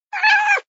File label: meow, cat